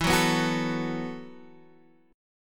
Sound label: B 7th Suspended 4th E